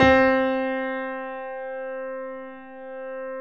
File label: C 3, Keyboards Acoustic, GRANDP ST; USB Soundscan vol.17